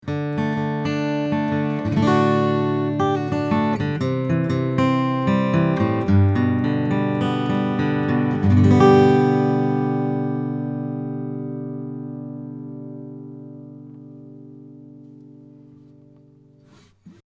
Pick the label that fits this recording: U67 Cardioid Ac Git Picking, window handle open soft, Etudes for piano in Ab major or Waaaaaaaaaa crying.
U67 Cardioid Ac Git Picking